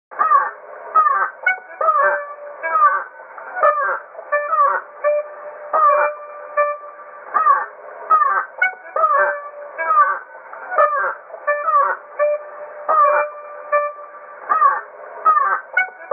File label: beluga and clarinet mix